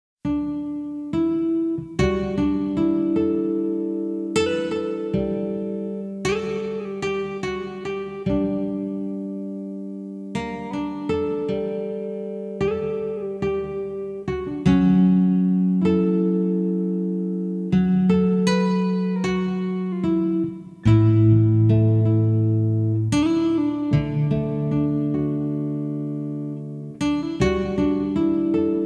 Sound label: GUITAR; best sound of gamemusiclibrary special kanon 2000